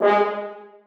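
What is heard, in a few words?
horns staccato g3; Sonatina Symphonic Orchestra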